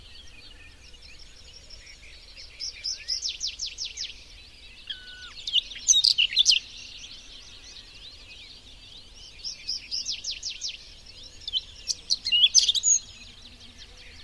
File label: common snipe - whinchat - tree pipit; soundscapes